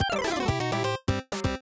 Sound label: Battle Win Pixel Mix